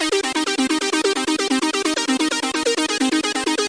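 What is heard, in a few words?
psytrance synth, 130 bpm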